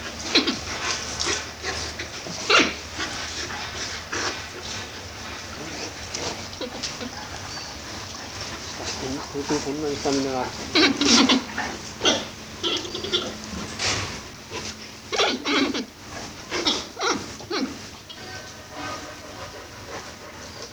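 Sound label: giant panda, ジャイアントパンダ 鳴き声; 遠くまで聞こえるいななきのような鳴き声も出します。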